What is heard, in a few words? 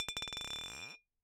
Bottle Struck